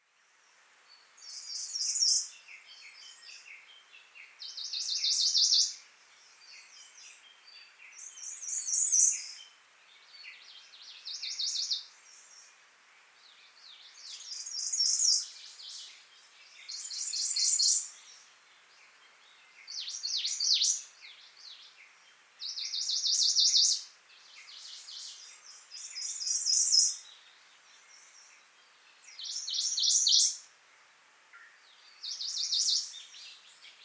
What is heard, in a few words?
phylloscopus ijimae twitter